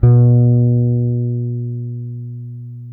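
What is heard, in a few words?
C 4, French fretless bass; Spectrasonics; Bass Legends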